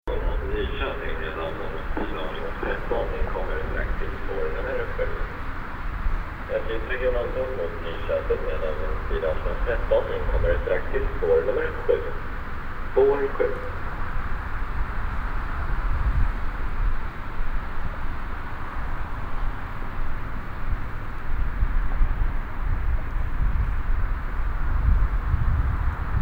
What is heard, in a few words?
Train Platform Tunnel Stockholm; lectroteque